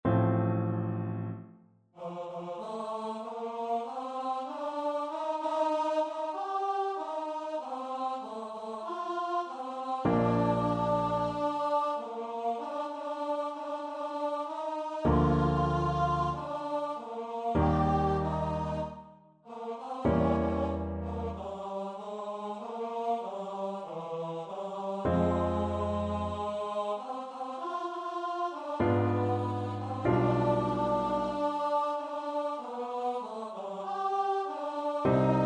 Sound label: st matthew passion, choir; bach